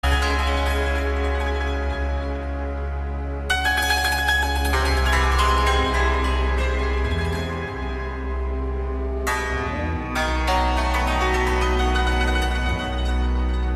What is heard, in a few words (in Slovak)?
cimbal